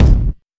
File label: warm kick drum single shot f key, Royality free kick drum single shot tuned to the F note.